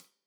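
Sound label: hat closed, hi-hat cymbals stereo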